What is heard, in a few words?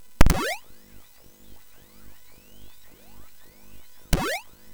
Sound Fx, MOS6581; MOS6581R4; hvsc